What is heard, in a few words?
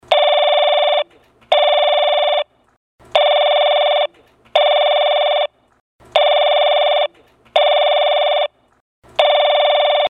Call